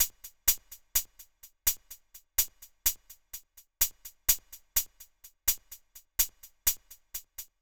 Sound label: Hihat Loop - 126 BPM, live; Chocolate